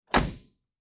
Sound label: Auto Close Door Exterior